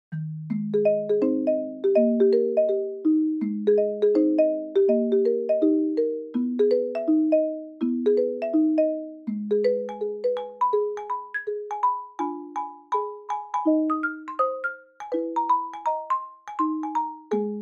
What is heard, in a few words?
Fast; bgm; Maple Leaf Rag